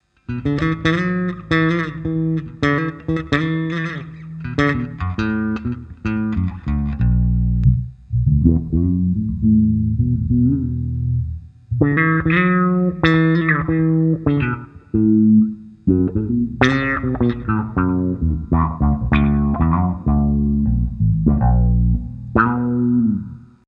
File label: dreadbox Kinematic Bass